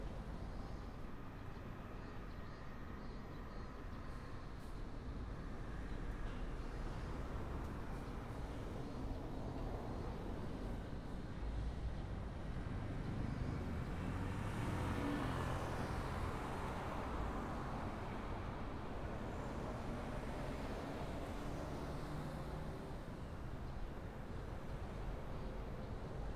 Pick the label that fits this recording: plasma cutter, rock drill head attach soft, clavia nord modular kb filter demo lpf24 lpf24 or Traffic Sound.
Traffic Sound